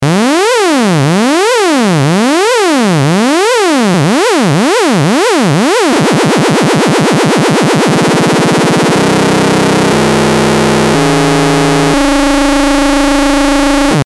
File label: saw LFO